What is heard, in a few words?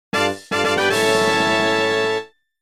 Get Small Item; The Wind Waker